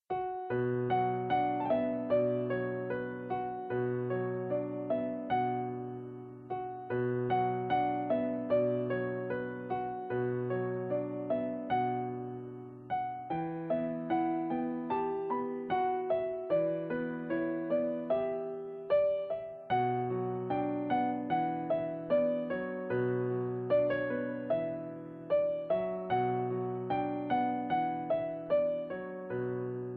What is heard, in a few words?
xmas ensemble